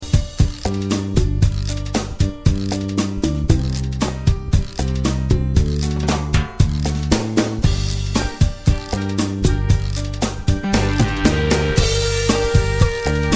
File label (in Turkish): bk-7, roland bk-7m ritim modul